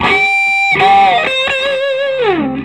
SOLO, Vintage Blues Guitar; USB Soundscan vol.22; AKAI

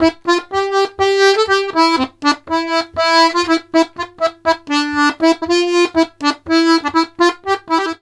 POLKA, Accordions; USB Soundscan vol.40; AKAI

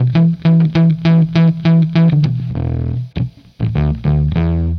Processed Electric Bass

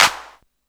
CLAPS HOU; Best Service Dance Mega Drums

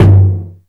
Low tom, Roland JX-305

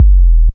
SUB KICK; Back In Time Records - Big Bang

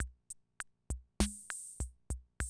CR78, DrumLoops

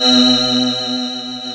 GLASS B2, PPG; USB Soundscan vol.49 - Analog Fantasies; AKAI

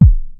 MEGA KIT, DRUMS; Kid Nepro; Elite

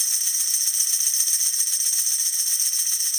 Tambourine Roll Constant; Sonic Foundry (Sony Creative Software); Joe Vitale Latin Percussion

